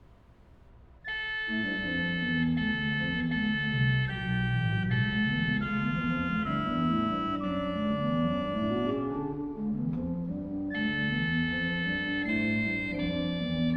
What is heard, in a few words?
Choral Prelude, Organ; ''Durch Adams fall ist ganz verderbt''; Johann Pachelbel; Complete Organ Works